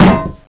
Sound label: landmine drop; fallentitan